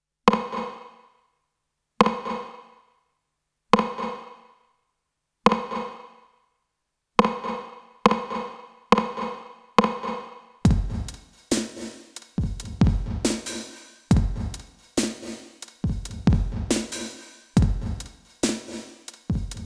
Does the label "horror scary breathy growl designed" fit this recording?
No